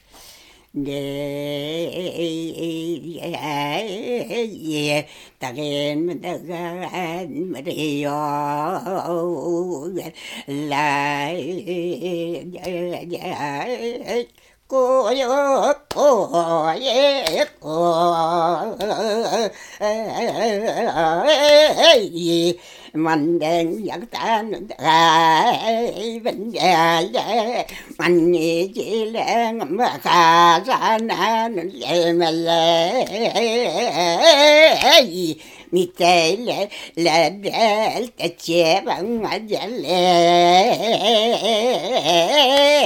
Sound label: Singing